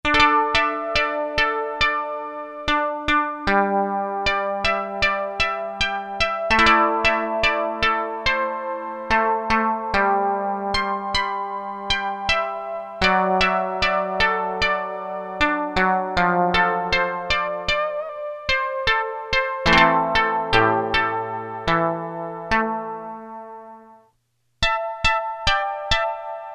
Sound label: Koto, Casio MT-600; Tones